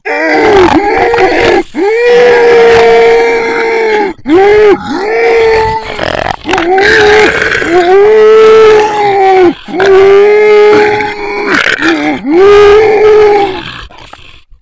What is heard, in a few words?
moan loop, zombie